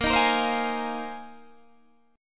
A sharp 7th Suspended 2nd Suspended 4th, down, Guitar, Open D